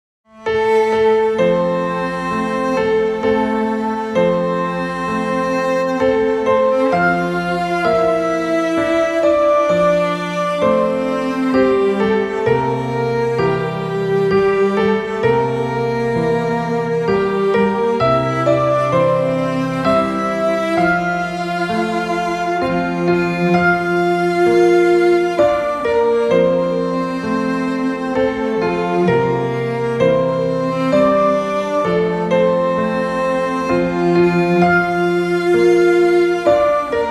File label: lullaby background orchestral music